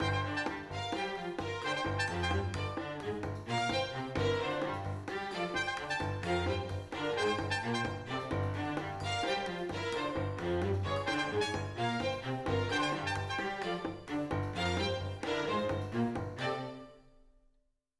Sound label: Field Battle